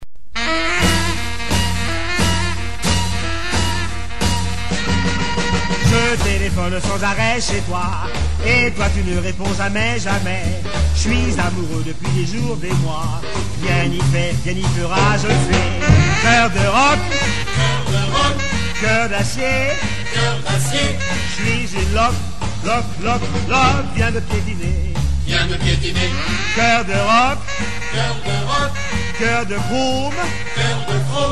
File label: rock